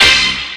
STAB

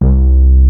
Sub Sonic Bass C2

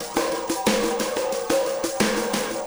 Ripple Perc

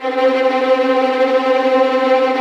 STR TREM, Vlns Tremolo

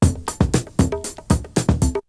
Drumloop; ZERO G - Datafile DISC 3

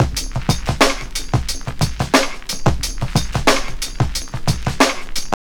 TRIP 90, trip loop

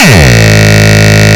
kick

